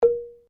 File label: GAMALAN BAMBOO MF, Indonesian Gamalan copy; Hits (Sound Effects:Musical)